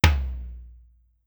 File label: Tom Mid Lo, Clavia DDrum-AT drum machine, Pitched Down; 300 Drum Machines